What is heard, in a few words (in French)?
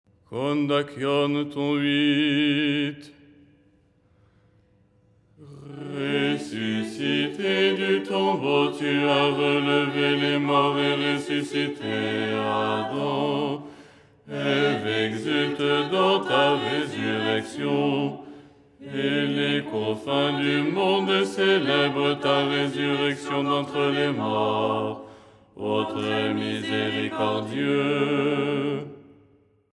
basse